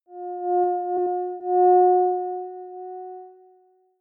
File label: simple moving sine short